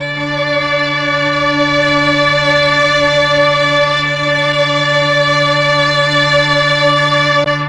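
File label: MELLOTRON strings D3; Big Fish Audio - First Call Vintage Keyboards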